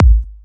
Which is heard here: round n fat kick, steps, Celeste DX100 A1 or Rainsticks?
round n fat kick